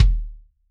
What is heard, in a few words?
Kick Hard, Drums